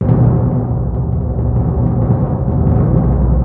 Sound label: TMP C#, Synclavier Percussion